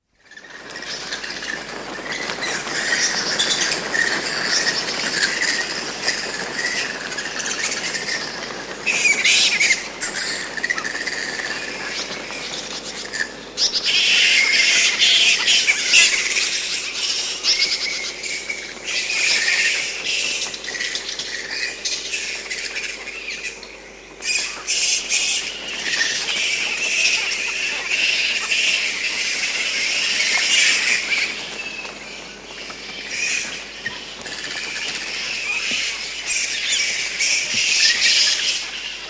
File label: forest flying fox; bbc